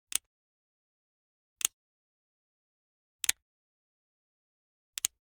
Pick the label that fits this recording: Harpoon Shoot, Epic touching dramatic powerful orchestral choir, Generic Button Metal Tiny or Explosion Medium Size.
Generic Button Metal Tiny